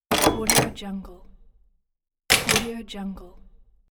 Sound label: door opening